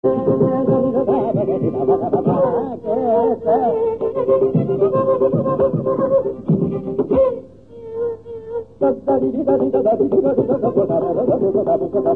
kannada swarakalpana; Carnatic Manodharma; saketa

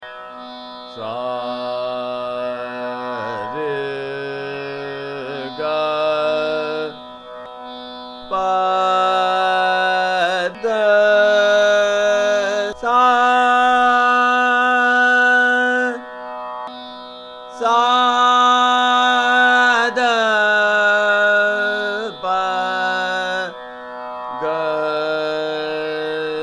Bhoopali Aaroh Avroh

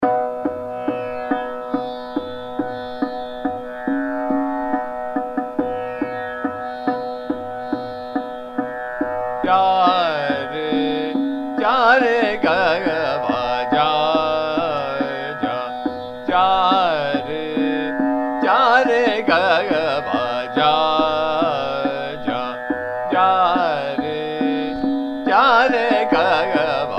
Chota khyal Ja Re Ja Re Kagwa Ja Trital, Yaman

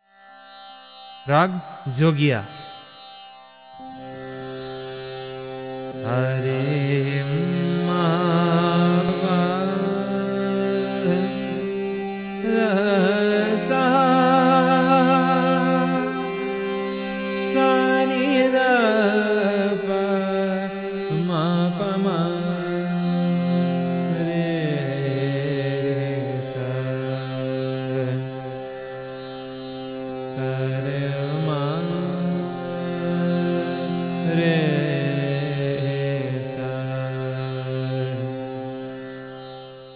jogiya, raga

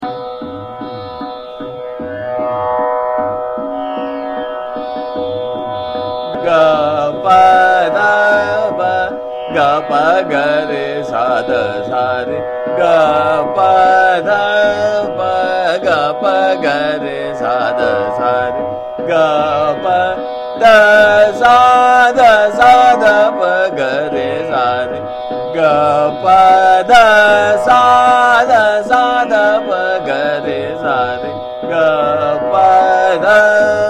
Sargam, Ga Pa Dha Pa Ga Pa Ga Re Sa, Trital; Shivranjani

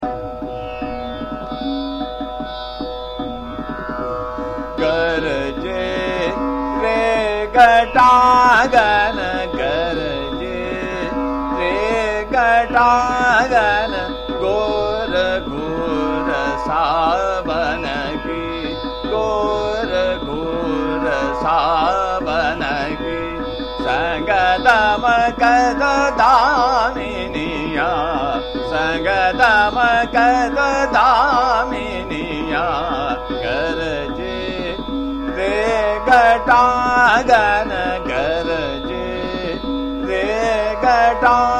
Chota Khyal, Ektaal Drut; Gaud Malhar; Garje Re Ghata Ghan

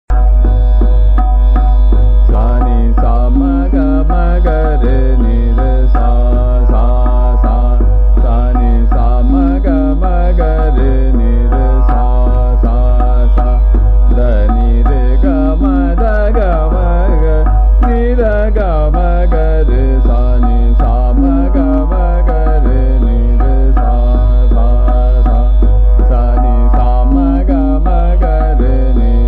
Sargam Sa Ni Sa Ma Ga Ma Trital, Puriya

